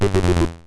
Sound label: down; fargoal